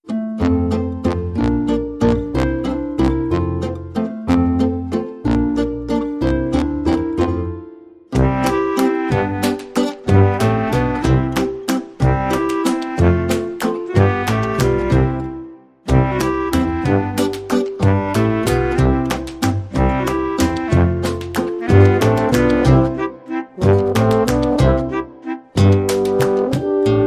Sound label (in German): Anette Klarinette